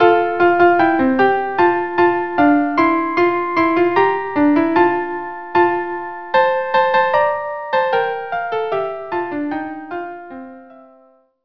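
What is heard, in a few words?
Rondes violin violin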